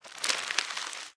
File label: shuffle deck; game; zhipai